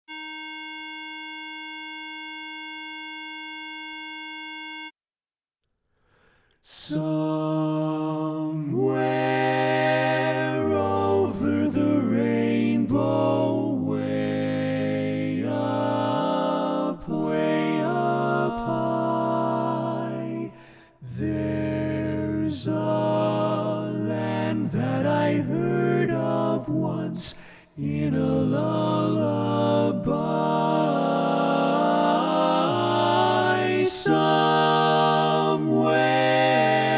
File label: tenor